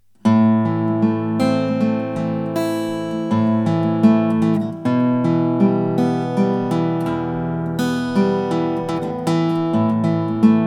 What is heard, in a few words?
Guitar